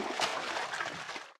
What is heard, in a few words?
slosh, footsteps, walk; player; source engine; left 4 dead 2; boomer